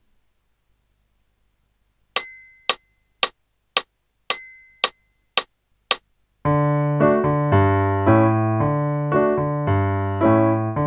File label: strings rock accompaniment